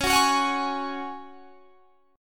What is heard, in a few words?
Db Suspended 4th Db; strummed; 21,21,23,23,21,21